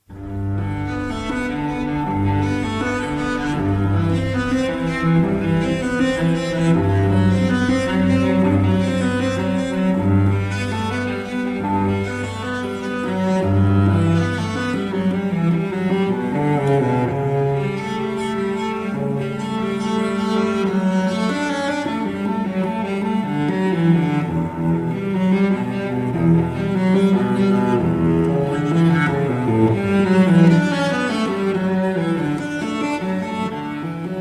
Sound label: cello suite ringtone; bach